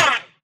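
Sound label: impact bullet generic titan shield; Titanfall 2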